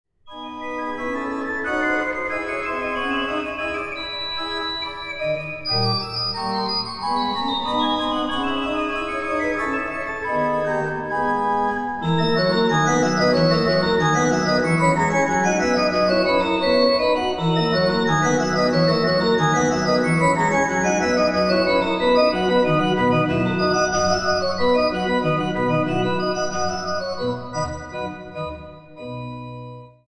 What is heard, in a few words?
organ; microphone test with organ